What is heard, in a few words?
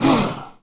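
damage, monster, fox ninetail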